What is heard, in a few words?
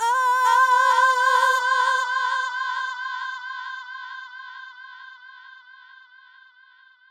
Female Effected, Effected Vocals; Hip-Hop and RnB Vocals